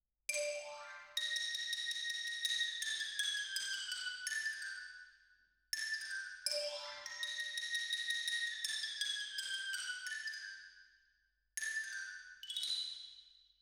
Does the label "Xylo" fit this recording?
Yes